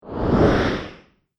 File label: Big Dragon Wing Flap; horror; Monster Sound Effects